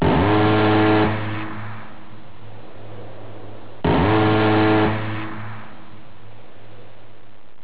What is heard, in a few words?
20mm vulcan cannon